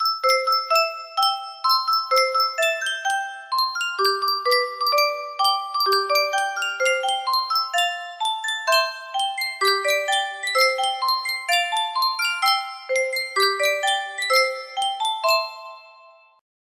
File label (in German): Yunsheng Spieluhr, melody